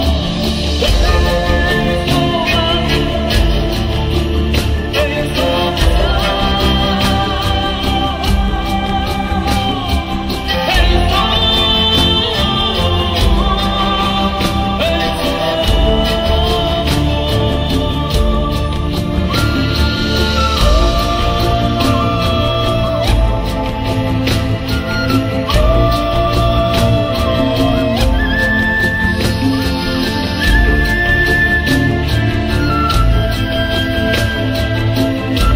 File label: maya music